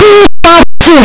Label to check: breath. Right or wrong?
Wrong